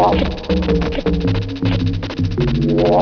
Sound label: children